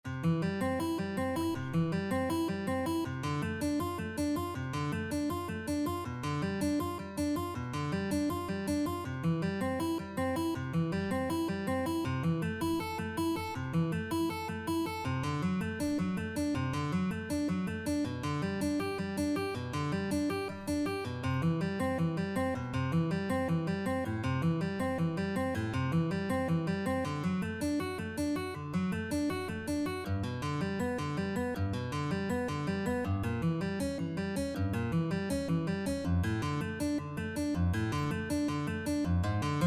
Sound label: bach prelude gtr